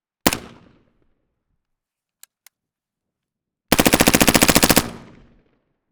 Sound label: GAS STATION G36 SINGLES BURST 0M FRONT ON GUN; DPA4062; AUDIOBEAST; FIBUA; WEAPONS RECORDING PRIMER